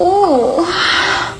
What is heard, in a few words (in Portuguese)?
gemido